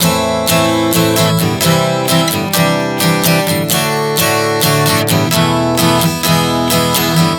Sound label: Am-C-D-E 130 bpm, acoustic guitar chord progressions